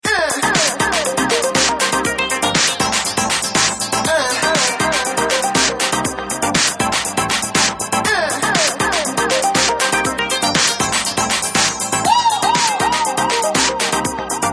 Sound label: Ringtone, Samsung GT-S3310